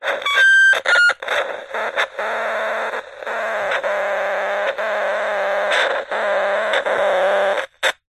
technology cb radio walkie talkie crackle interference; zapsplat